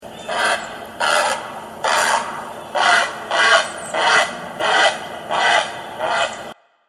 Great Blue Heron

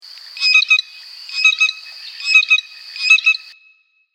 Blue Jay

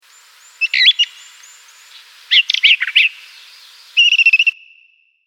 Brown Thrasher

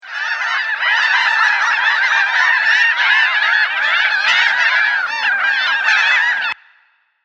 Laughing Gull

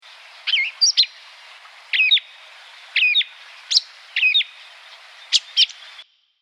Catbird

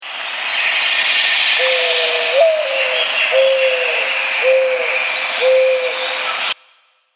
Mourning Dove

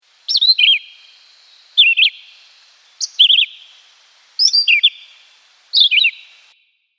Chestnut Sided Warbler

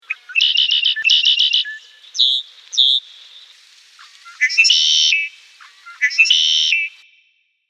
Red Winged Black Bird

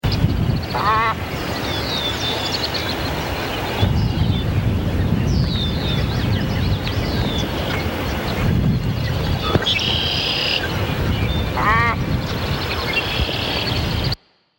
Glossy Ibis Call

